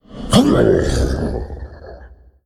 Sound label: Male Faceless Death